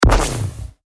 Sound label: particle canon